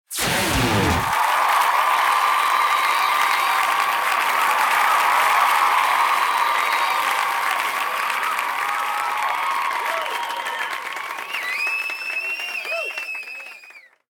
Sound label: star power; fofix; MegaLight GH3